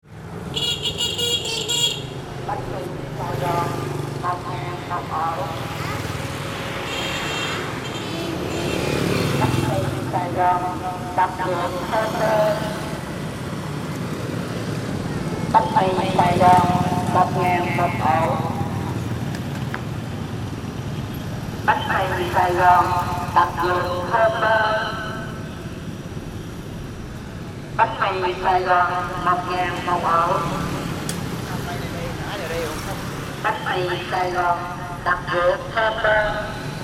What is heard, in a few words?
Hoi An street